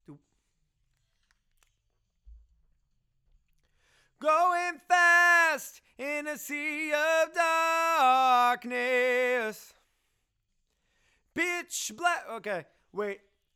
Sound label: Chorus